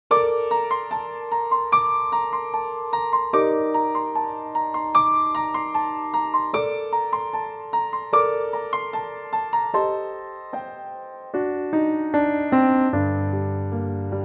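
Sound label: piano G minor; key - Gm - vocal range - A to Eb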